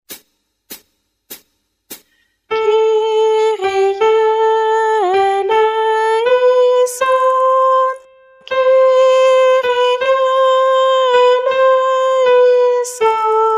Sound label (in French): Messe de St Paul Kyrie Guide chant Soprane